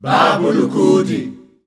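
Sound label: Rhythmic Chants, Voices Of Africa, 90 bpm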